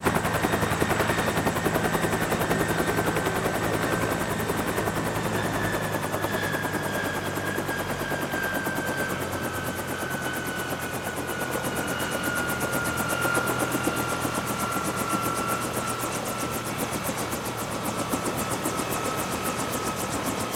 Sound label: Helicopter Bell 212 Land, Idle Looped